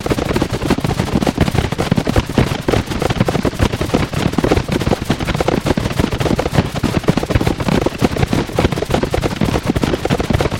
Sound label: ambient uma footstep